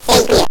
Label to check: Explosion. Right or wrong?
Right